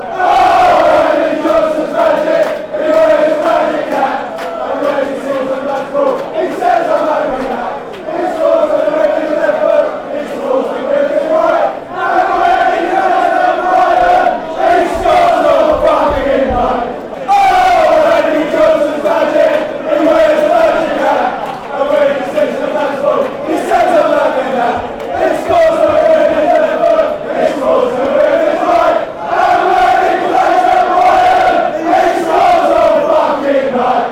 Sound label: magic fanchants; andy johnsons